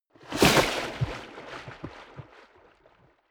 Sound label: waves splash hard